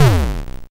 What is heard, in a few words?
kick